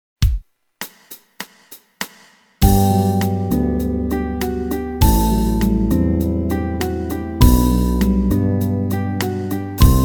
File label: Pedaling The Scale